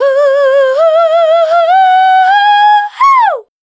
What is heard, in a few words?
VOC F, Dance Vocals, FEMALE